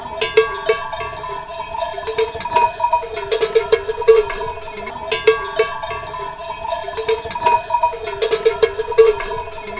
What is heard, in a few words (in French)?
bergerie cevennes, sonnailles